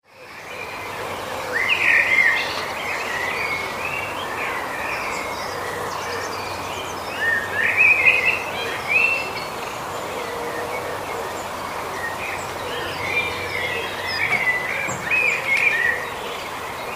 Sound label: blackbirds singing; ufford